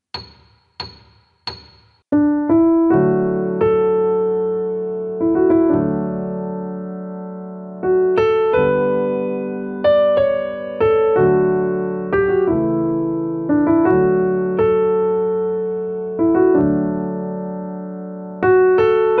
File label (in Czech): 90 metronom, klavír